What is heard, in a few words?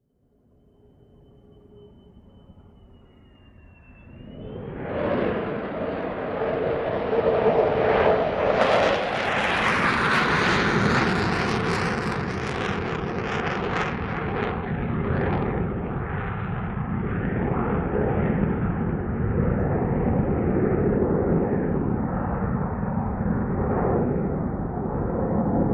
F4 Phantom By Afterbu, F-4 Phantom: In, By And Afterburner Away